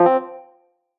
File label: Done Sound